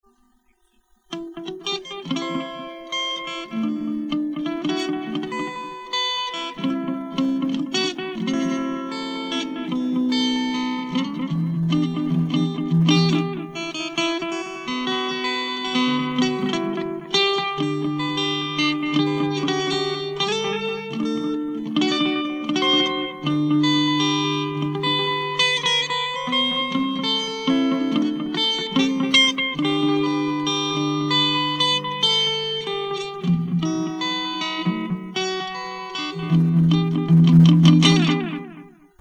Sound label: delay